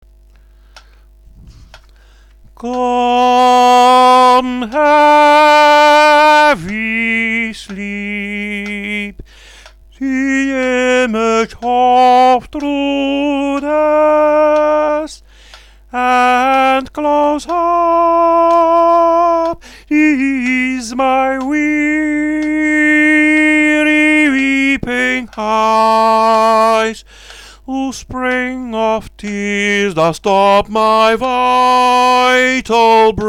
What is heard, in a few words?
Come Haevy Sleep Sop